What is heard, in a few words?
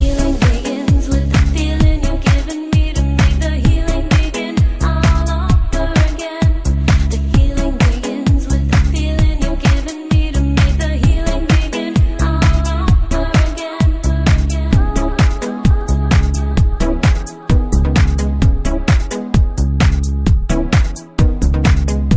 Clubbing tune